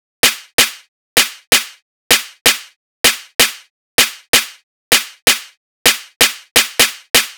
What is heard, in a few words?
Snare Groove tribal 128 9a